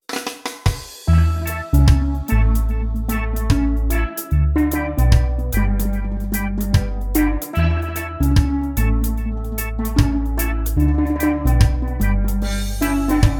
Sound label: Solo Steel Drums